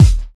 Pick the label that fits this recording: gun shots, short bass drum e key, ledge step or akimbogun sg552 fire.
short bass drum e key